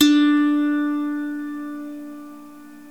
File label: SWEDISH; World Combo